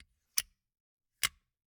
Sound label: Gumball machine, candy door, plastic, open, shut, hyper; Eiravaein Works; Vaeyan IV